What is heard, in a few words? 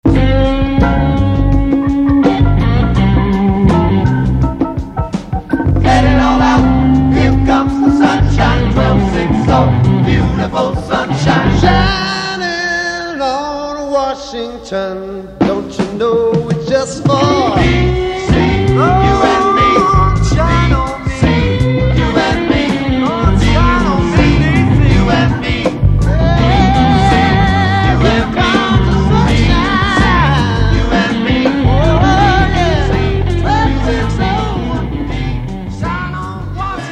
Funk; WWDC